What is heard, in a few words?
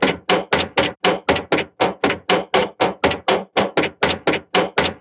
engine damaged; World of Tanks Blitz